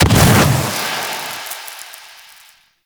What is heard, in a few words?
Ghost Death Explosion; Gameplay; Warframe; Chimera Prologue